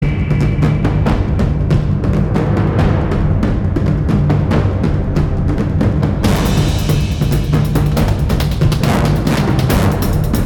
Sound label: Marching Tune